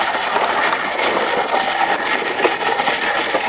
concrete; XBOX; Amped 2

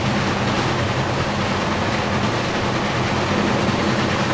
train loop; Soldier Of Fortune II Double Helix; XBOX; ambience

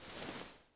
body slide gritty, foley

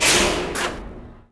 subway move slow end

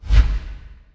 whoosh

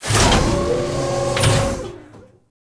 deploy activate

